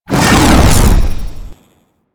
Teleport End; Warframe; Captain Vor

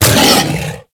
Feral Vocal Angry Attack Short; Enemies; Kubrow; Warframe

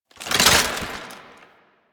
Rocket Launcher Reload; Weapons; DE; Warframe; Tenno; Lasrian

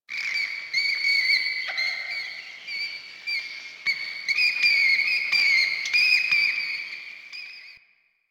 Dungeon Island Cave Bats, Ambience; Warframe; Duviri

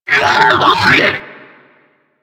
Sentient Pain, Enemies; Warframe; DE; Sentients; Ortholyst

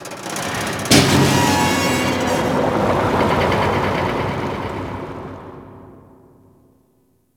Ocean Metal Bubbles; Warframe; Grineer Ocean

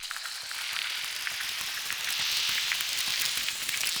Soul Steal Pull Loop; Powers

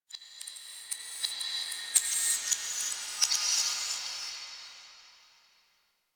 Metal Whispers; Ambience; Warframe; The New War; Quests; Zariman

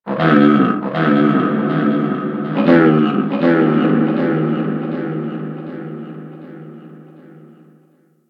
Outpost Creature, Ambience; Warframe; Corpus Outpost

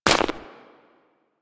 Step Ice, footsteps; Warframe; Grineer Royal Jester; Foley

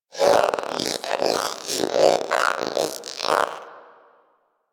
Vocal Breath Run, Pets; Warframe; Zanuka; Zanuka Companion A

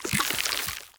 Sludge Damage Proc; Procs

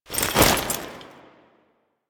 Foley Weapon Swap; Warframe; Kahl

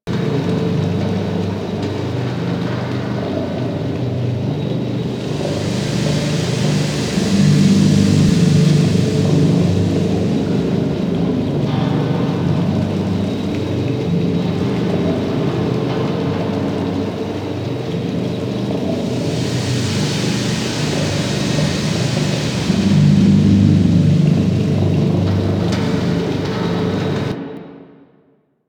Ocean Interior Amb

